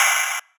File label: OPEN HAT